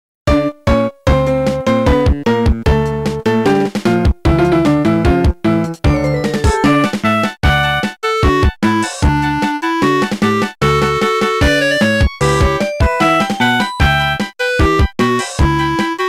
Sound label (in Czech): melodie